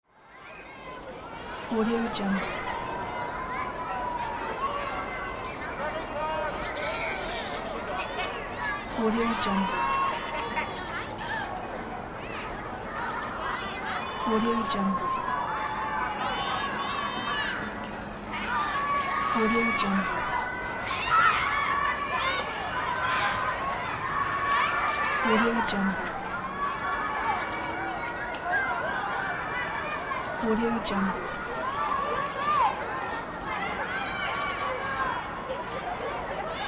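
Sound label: playground ambience children playing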